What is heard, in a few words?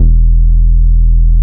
JUNGLE BS E2, JUNGLE BS